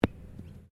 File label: Golf ball impact grass; Various Golf sounds; Sports